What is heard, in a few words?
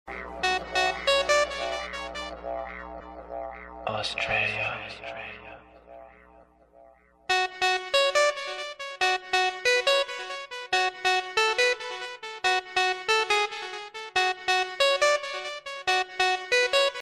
beep trance hit ringtone